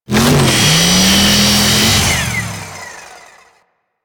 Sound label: Saw Ride Start; Weapons; Melee; Warframe; Grn Ghoul Saw Player